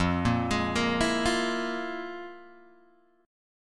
F Minor Major 7th Double Flat 5th, Guitar, Open G

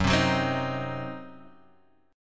C Diminished F sharp

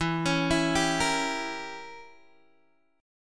E Diminished 7th E down Guitar Open D

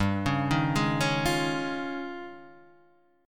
D sharp 11th G

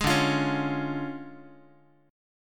D Minor Major 7th Flat 5th, D, Guitar; DmM7b5 strummed; JGuitar

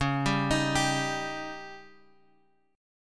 Db Suspended 2nd Flat 5th, Guitar, Drop D

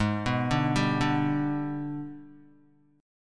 Gsharp Minor Major 7th Flat 5th, Guitar; arpeggiated; 3,2,2,0,2,x; 4F,4A,5C,5G,5C,6E

